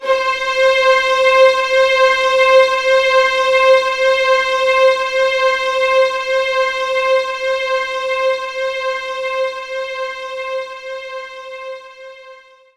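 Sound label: String Loop C4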